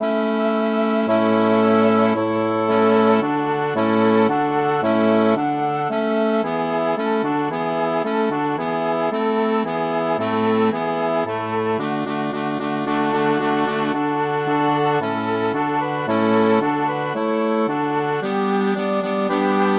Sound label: for woodwind quartet 16 hands; lassus orlande de; the echo song